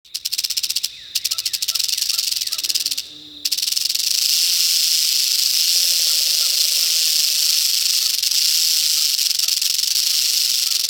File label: rattlesnake